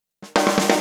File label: 125 NuJazz Snarefill